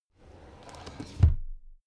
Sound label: drawer cabinet crammed close soft halfway